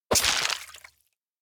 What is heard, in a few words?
spear crab hit; anemoi